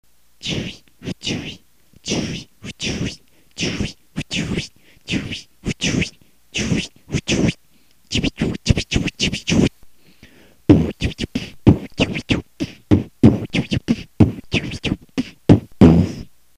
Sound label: Easy Scratch, beatbox